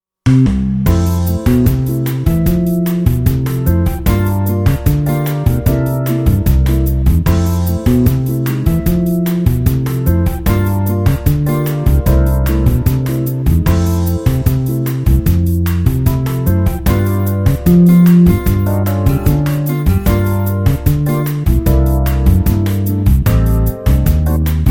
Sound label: brass minus